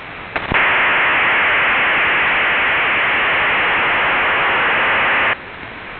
MIL-STD 188-110A / 2400 Bd (SERIAL); (mostly associated with LPC-10e and MELP vocoder); LINCOMPEX

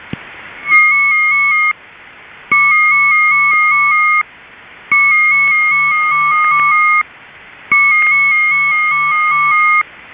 HC TONECALL, HC-265 EMBEDDED TONECALL; HAGELIN CRYPTOS (CRYPTO AG)

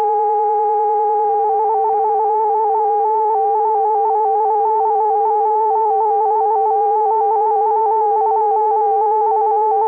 PICCOLO VFT

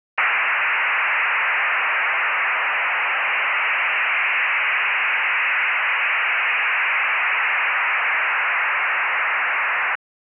3G HDL DATA 24 PACKETS; MIL-STD 188-141B App. C BW2 HDL DATA PDU (24 packets per burst)

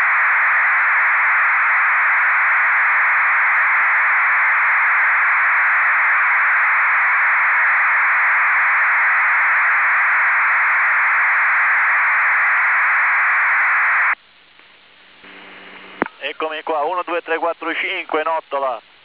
ITALMIL PSK

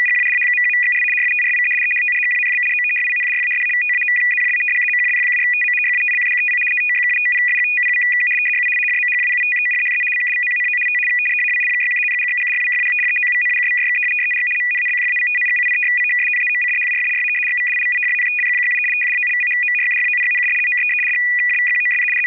RUS-NY 75Bd 500Hz; CIS-MIL 75 Bd FSK system 75 Bd/500 Hz shift; FSK-systems

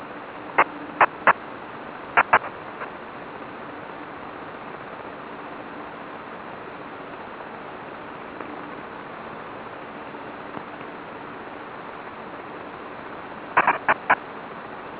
FHSS; HARRIS AHFDS in frequency hopping mode; HARRIS ADVANCED HF DATA SYSTEM; PSK-systems

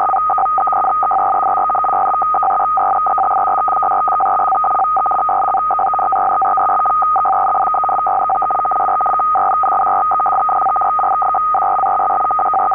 CIS14 TFC; CIS-14 in tfc. condition on one channel and idle on the other; FSK-systems

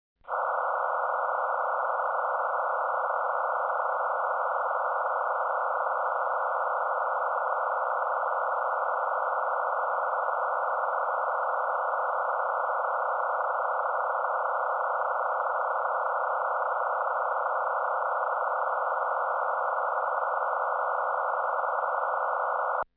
MT63-1000

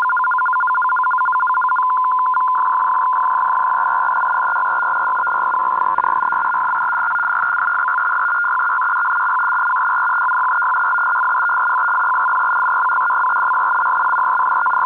FEC A 192; FEC-A-192 sending tfc.

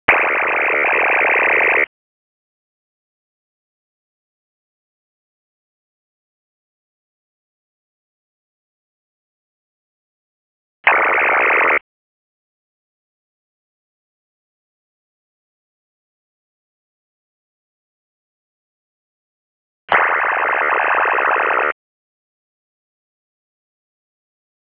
300Bd 8FSK, UNID BURST MODE 300 Bd 8-TONE MFSK

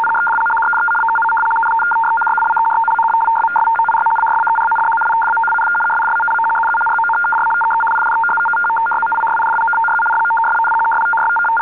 CIS 73 Bd PSEUDO#

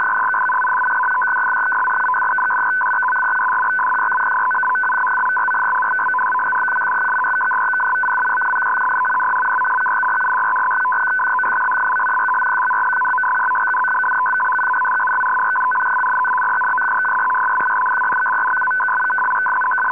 BULGARIAN DIPLO 107.53 Bd PSEUDO# FSK SYSTEM

